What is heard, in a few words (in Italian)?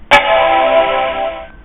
Avvio power book 170; Una registrazione ambientale di un PowerBook 170 della mia collezione privata.; Mac